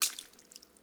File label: Footsteps Puddle One